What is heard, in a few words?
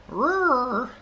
roar vap0r